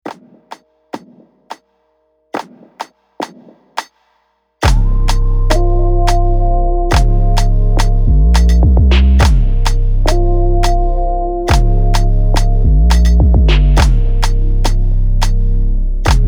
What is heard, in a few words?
Instrumental